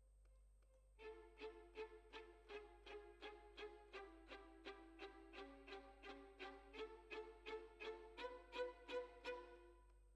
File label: Violins, jazz for piano